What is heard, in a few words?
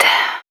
Vocal Oneshots; Vengeance Samples; Vocals